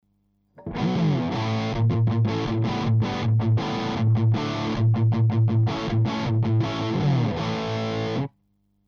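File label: rhythm Position KSM313 back, guitar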